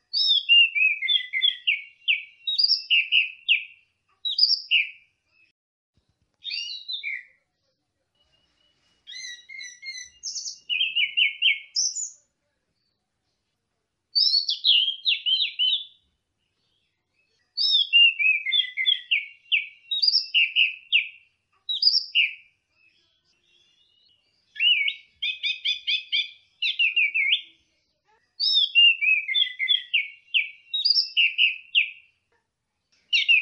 suara anis merah; masteran

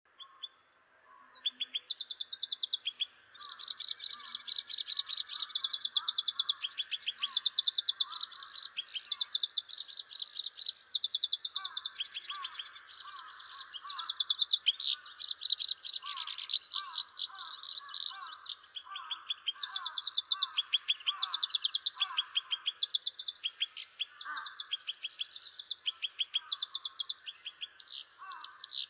Suara Burung Kerak Basi; untuk Masteran Durasi Panjang

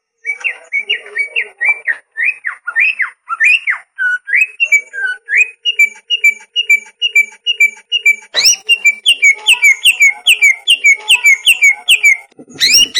Suara Cucak Ijo Ngerol Panjang

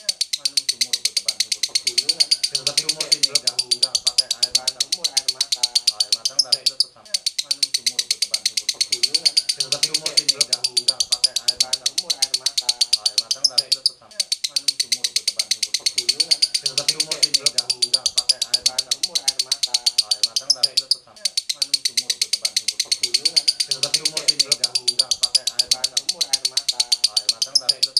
Suara Burung Lovebird Ngekek Panjang

